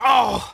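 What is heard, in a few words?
m oof, male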